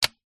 leisure toy gun plastic shoot single

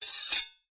knifes medium scrape blades together

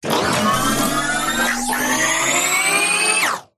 science fiction robot power up surge; zapsplat

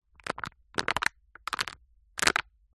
household plastic cup disposable handling

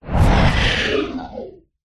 horror monster creature impact growl squeal

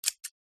office scissors cut air; zapsplat

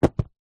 household books pile set down on surface

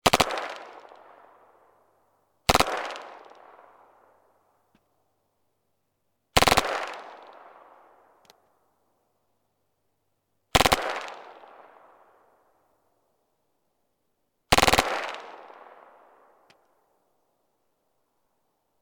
gun ppsh; still north media

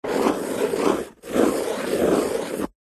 office pen draw shape on card back of paper pad; zapsplat

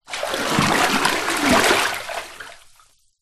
household bathtub water movements; zapsplat

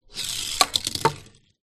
leisure toy car metal crash; hot wheels

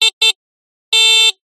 scooter honking; the sound pack tree

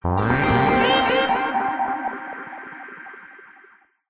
synth space computer power up short

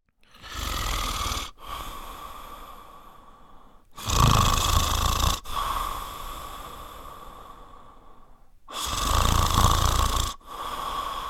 human male snoring

